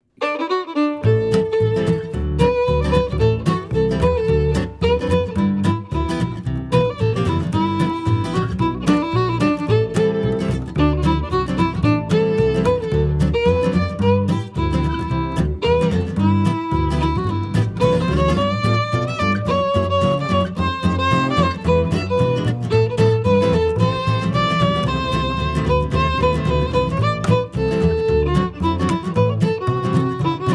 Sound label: violin